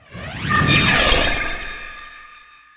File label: shrine; Heretic II Demo; items